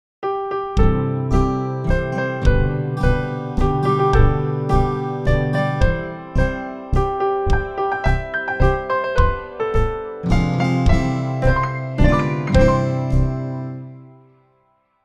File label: Birtday Tone